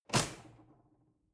drawer cutlery close draw short

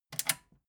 metal button click power off fast

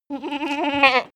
goat baa mild

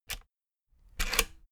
rotary control plastic rattle turn

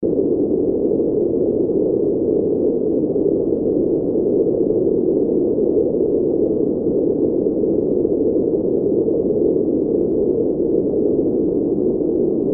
underwater mid freq atmo

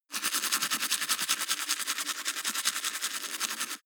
teeth brush wet open front fast

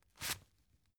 tissue take

